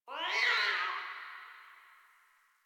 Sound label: Distant Cat Screech; Warframe; Ambience; Nineteen Ninety Nine